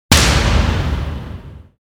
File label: slap hit big